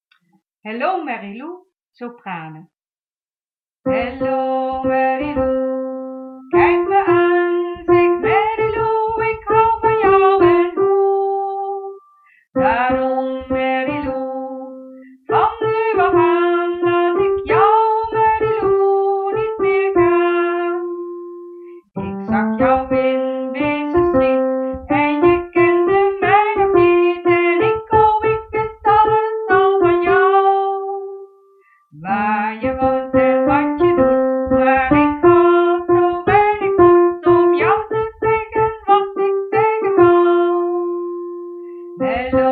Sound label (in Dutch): sopranen